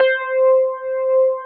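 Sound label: Wurlitzer C5, Keyboards Old School; USB Soundscan vol.09; AKAI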